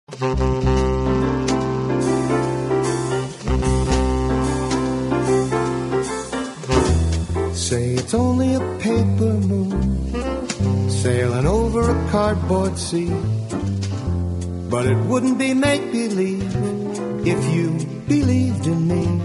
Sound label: Piano